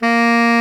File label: TENOR SAX A#3; Greytsounds VOL-1